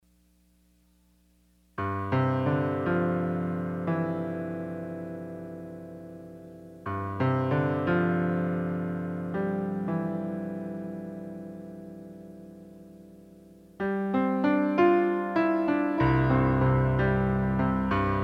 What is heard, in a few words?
Piano Autumn